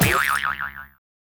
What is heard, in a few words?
Hit on Head, cartoon Foley Fx; musicradar; cartoon caper